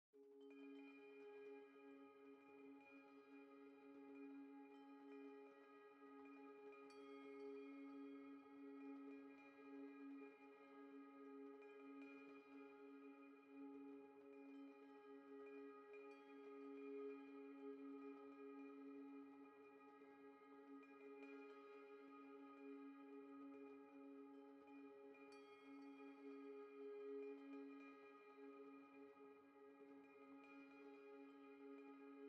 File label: tonal Amb Bell Spiritual C sharp; Ori and the Will of the Wisps